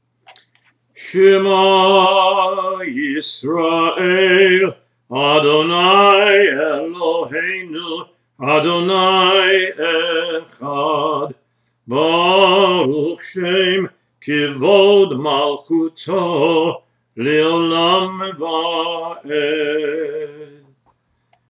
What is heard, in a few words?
Shema Traditional